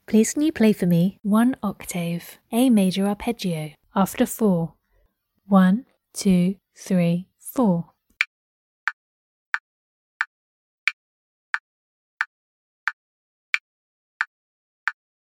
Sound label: a maj arp